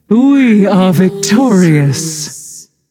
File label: "yay"; smite; voicepack; nuwa